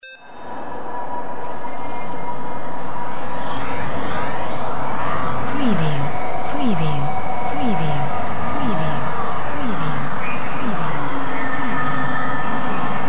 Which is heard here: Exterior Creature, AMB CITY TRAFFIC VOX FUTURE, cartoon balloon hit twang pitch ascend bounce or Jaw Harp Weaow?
AMB CITY TRAFFIC VOX FUTURE